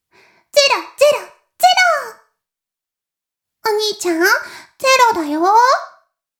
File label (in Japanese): 妹 しこしこ音声